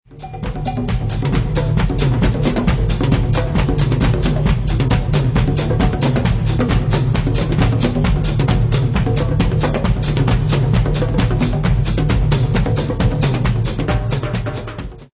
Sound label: Techno Live; drumlet